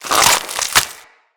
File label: Limb Stretch; Infested; Emissary Ancient Tank